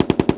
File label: m60 loop